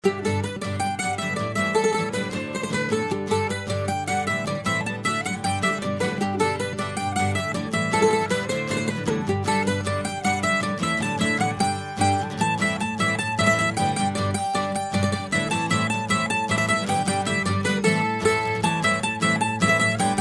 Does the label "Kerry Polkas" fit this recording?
Yes